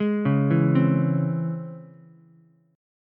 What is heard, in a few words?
Db Minor Major 7th Ukulele, DbmM7 chord; DbmM7 arpeggiated; JGuitar